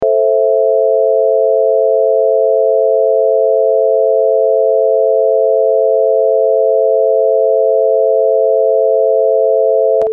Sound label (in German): Moll-Dreiklang